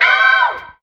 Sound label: ow disco gal SUNG; ooh ahh; ow ouch